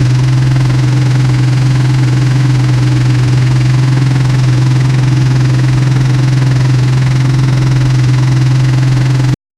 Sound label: loop suv engine v8 sound